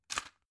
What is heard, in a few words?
drug blister put in hard; berufe; arzt